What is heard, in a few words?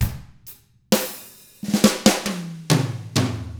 SLOW ROCK, Drums; ILIO; Double Platinum Drums 1